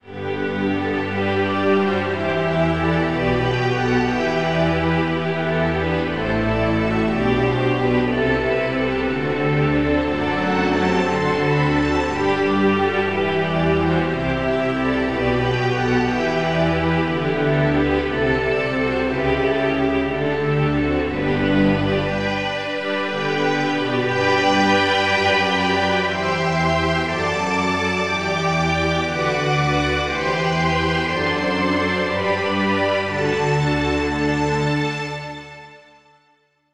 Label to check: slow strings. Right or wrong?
Right